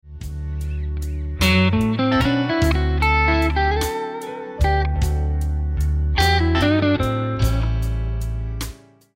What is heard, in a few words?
Lick, guitar; Beginner/Intermediate licks; Blues Guitar Method 1